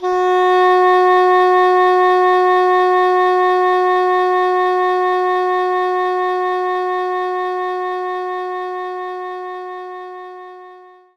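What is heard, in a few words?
English Horn F sharp 3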